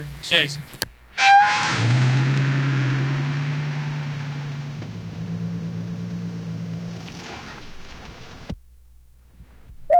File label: front view of a fleeing, car